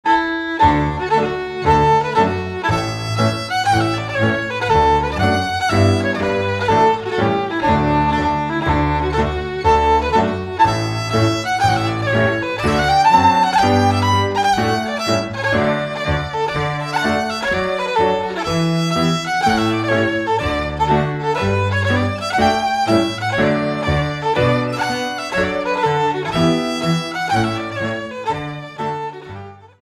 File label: Strathspey